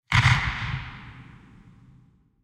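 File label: artillery start fire oneshot, tanks; War Thunder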